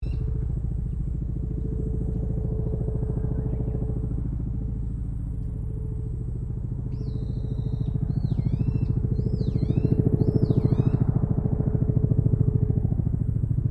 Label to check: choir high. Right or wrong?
Wrong